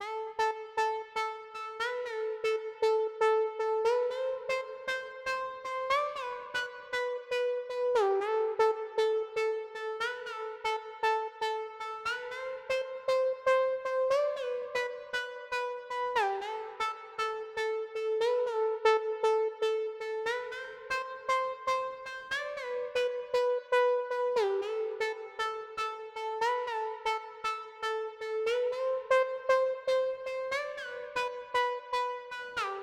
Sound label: 117 BPM A Min Lead, Sunset; Melodies; Loop Stems; Cymatics; GHOST (Beta Pack); Blizzard